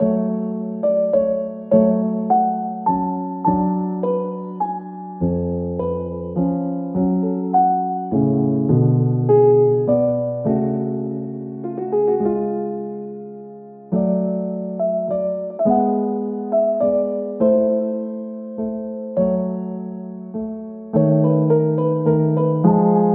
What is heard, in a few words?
Piano Concerto No. 23 in A Major, K. 488 - II. Adagio (Abridged); neon inferno; Mozart; Stage 3-2 Lobby